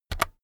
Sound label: keyboard external key press very fast